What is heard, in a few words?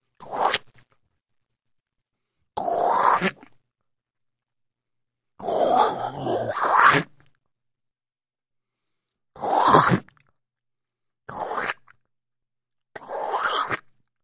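sounds of a person spitting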